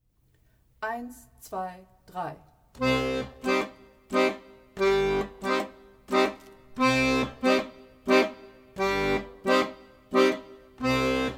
wechselbassuebungen mit dem moll akkord

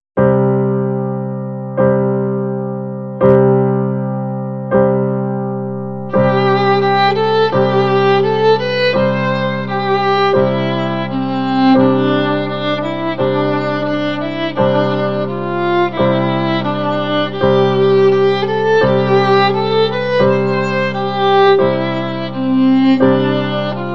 dudelsack melodie

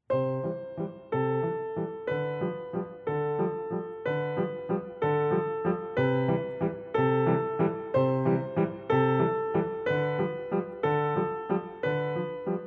Zirkuswalzer

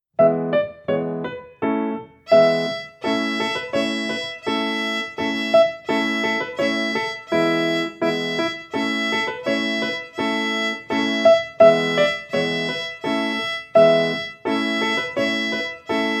kleiner marsch

